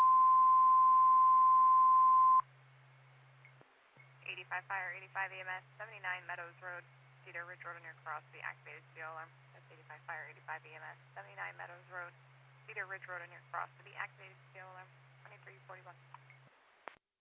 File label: Siren; Lafayette FD